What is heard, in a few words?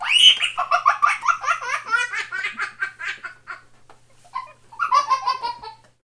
Laugh